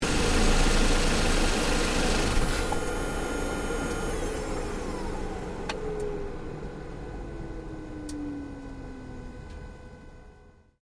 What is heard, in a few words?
Car Ford Focus Shutdown Engine Bay; Ford Focus hire car; Cars (Sound Effects:Vehicles)